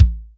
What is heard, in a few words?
Basic, Acoustic Kick; Yamaha DTXpress